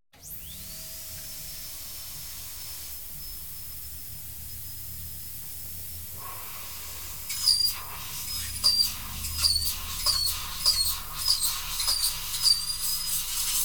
Dentist's Drill; KMP Film Video Sound Effects

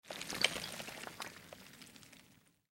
Crack Right; Blind Quest - The Frost Demon

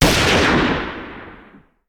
Hand Gun; Sound Ideas; Guns

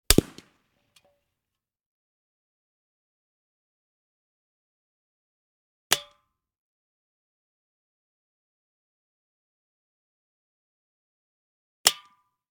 Bullet Hitting Tin Can; Hollywood Edge; Heavily Armed

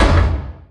Door Large Metal Stop; SFX Kit; Doors

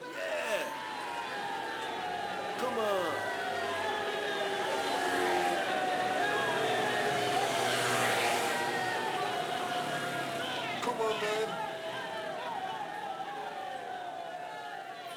Crowd Noises Jeering; Pitch Black Audio Pong

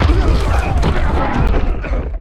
Football Hit Tackle

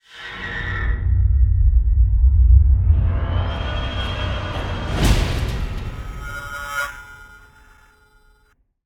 Riser; Blastwave

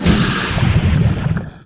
Pickup Underwater O2 Tank; Pickups

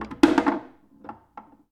Foosball Goal Score

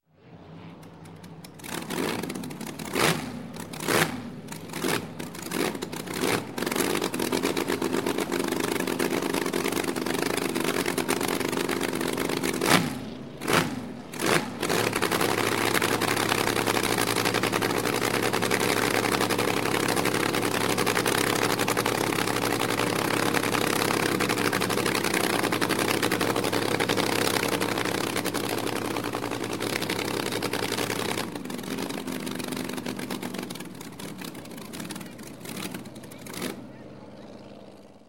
Boat, Race Boat Start, Rev, Idle, Shut Off; Race In; Sound Ideas; Series 2000

